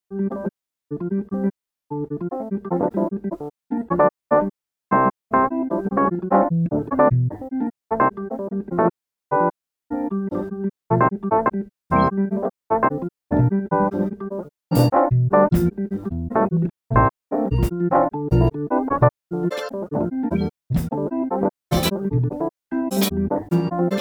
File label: Dirty Organ